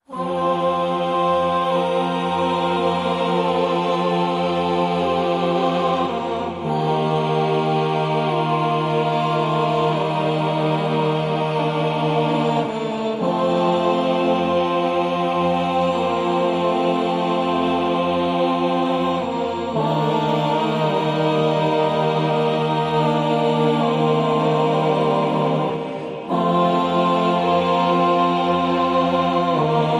Choir Stem

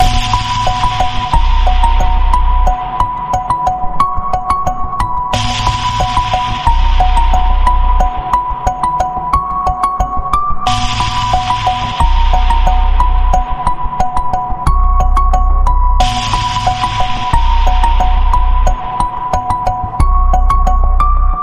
Clear Beginnings Pulse, B

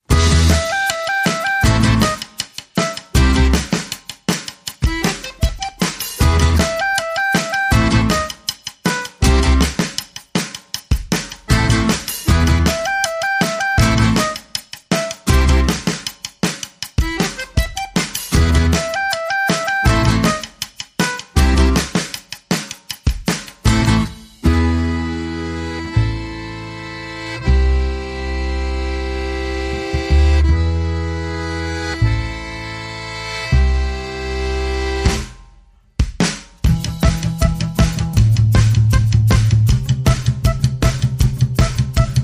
With Piano

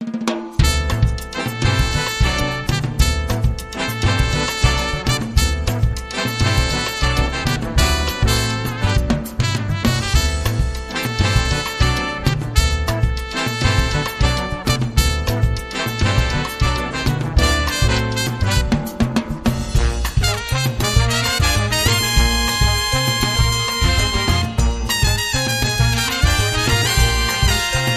Latin Brass

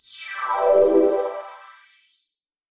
Sharp Chord Sweep

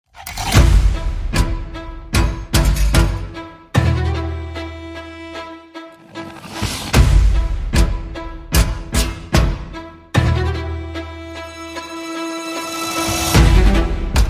Kingly Ticks

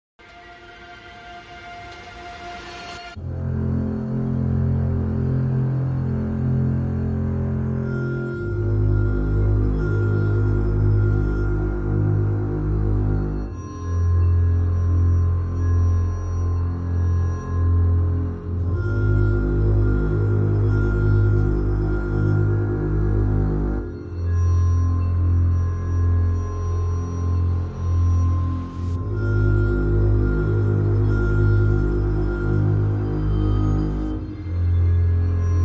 Orchestra

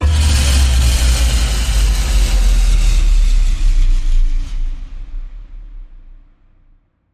Warriors Blast Power Down

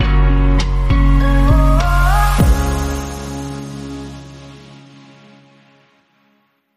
Bumper

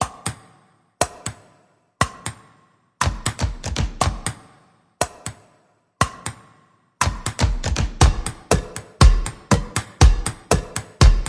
Percussion and Effects

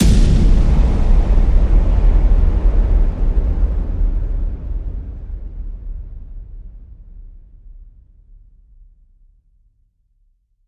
Hit Amb Digital Crunch

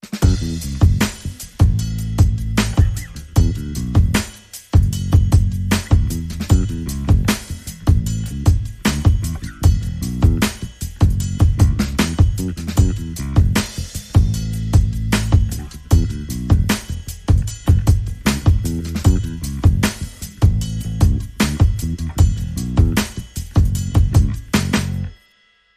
Drum and Bass

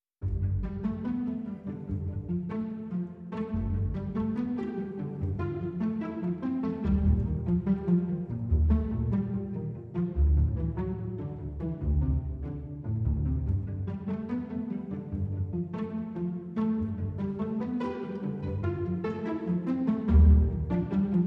Strings Only

